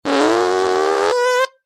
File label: fart